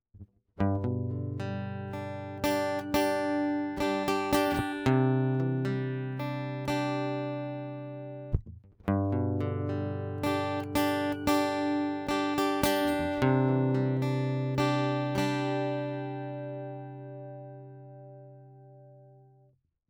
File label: Presonus io44 Guitar HIZ